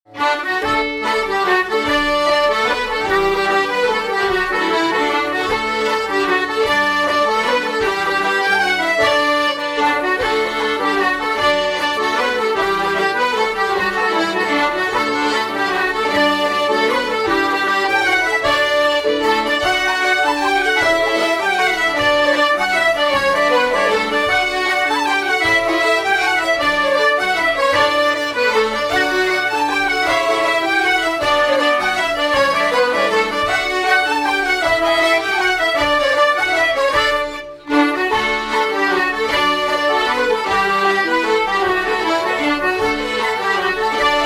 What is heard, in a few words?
Mazurka; Sonnys Mazurka